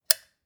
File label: Light Switch